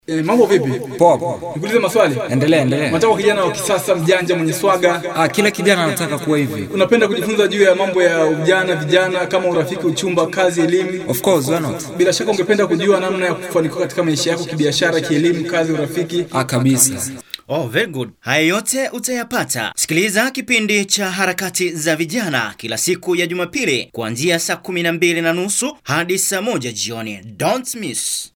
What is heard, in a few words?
jingle vijana; HARAKATI ZA VIJANA